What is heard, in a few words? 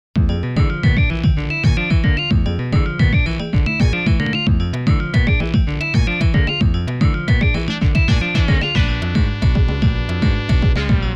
Percussion; Streets of Rage 3